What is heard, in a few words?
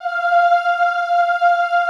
OOH F4, Classical Choir; Best Service; Extended Classical Choir